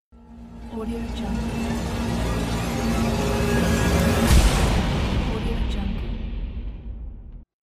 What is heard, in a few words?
horror and fear